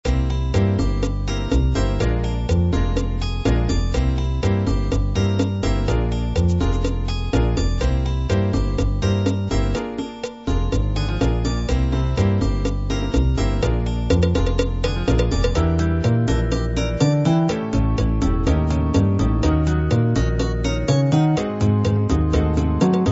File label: jazz chacha